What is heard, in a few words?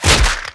ricecake damage; event